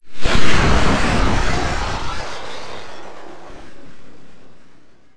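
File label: combat emp pulse attack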